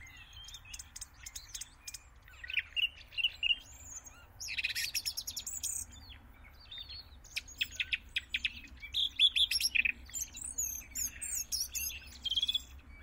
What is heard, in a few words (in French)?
grive